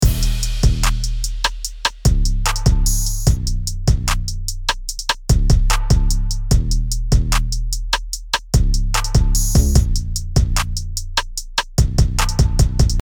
Type Beat Drum Example

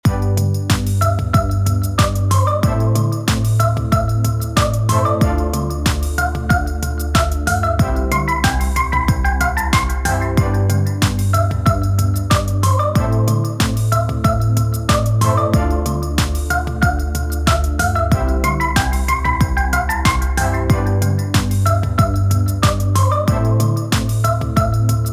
catchy hook

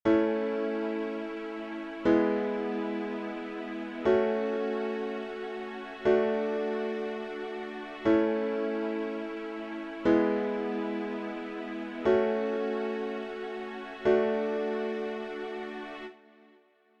A nice prog

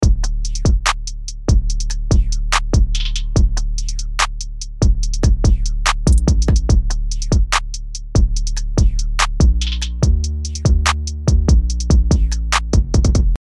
Drums

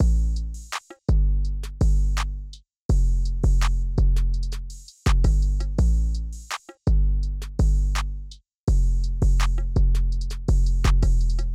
Type Beat Drum

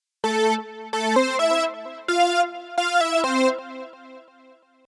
2 bar Melody A minor

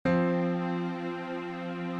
Fm 2 note F C chord

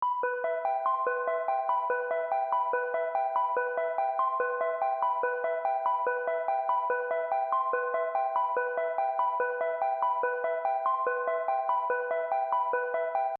Trap Beat Melody

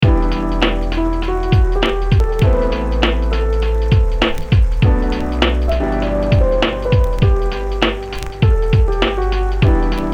LoFi Hip Hop Beat

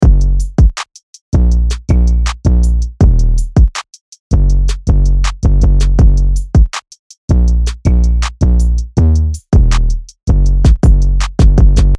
Type Beat Drums Example

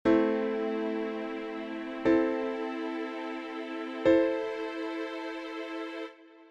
Am All Inversions

